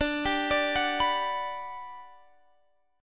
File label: G Major 7th D